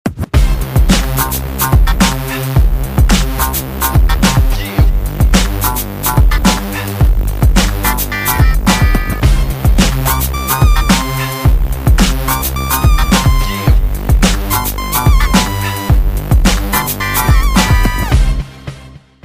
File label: Hip Hop Beat